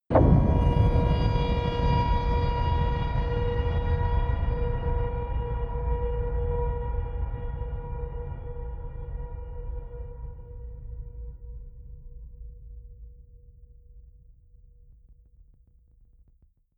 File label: sub explosion ring, impacts; hits explosionen